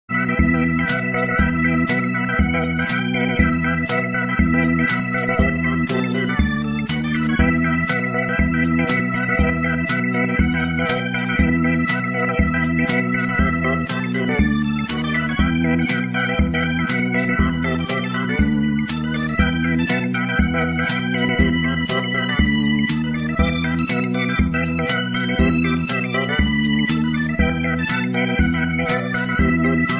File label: for hammond organ; Cybernoid